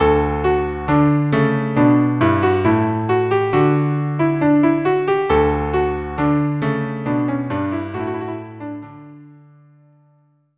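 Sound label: March D viola cello; jacquet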